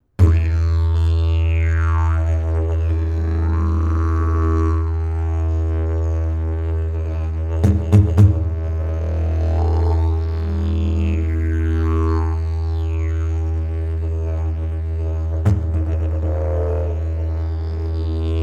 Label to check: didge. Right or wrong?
Right